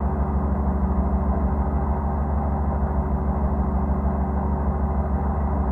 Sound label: Car Hydraulic Brakes